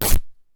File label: Rip, cartoon Foley Fx; musicradar; cartoon caper